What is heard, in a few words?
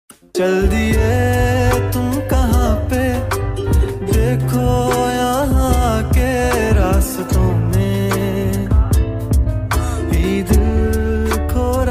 Ringtone